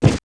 Move Jump; Movement

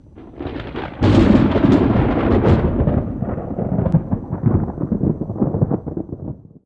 thunder

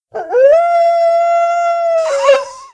Mob die; Monster; 욱일승천류; 견신

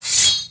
Window Open, interface

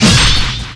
ONSLAUGHT LANCE ATT; smashing

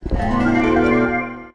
USE EAT

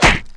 MOB BATTLE WOODSTICK, Battle Mob Weapon

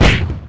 MOB BATTLE KNUCKLE, Battle Mob Weapon

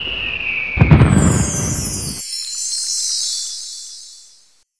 firecracker snow; SPECIAL EFFECT

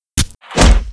Mob die; Monster; 뇌영조원

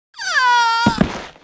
Mob die; 비조투영

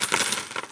money

